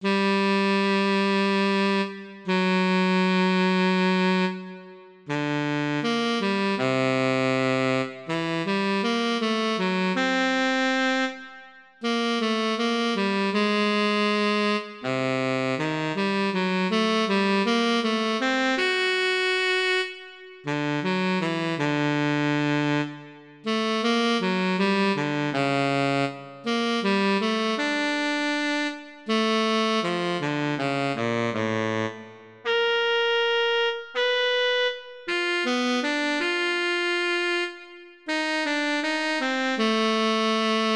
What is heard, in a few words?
Agnus dei variation T Sax; Staldophone